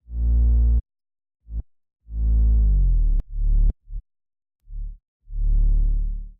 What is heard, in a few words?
Bass